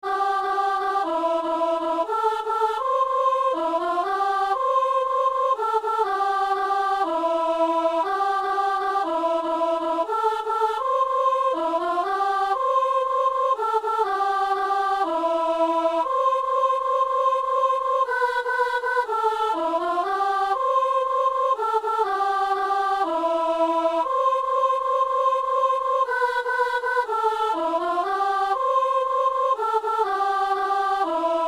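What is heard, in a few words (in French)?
ténor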